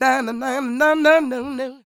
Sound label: SOUL, Vocal Planet, Blues & Soul; CW SOUL